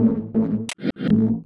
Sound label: 165bpm, Drum and Bass Percussion; EZ Rollers; Sneaker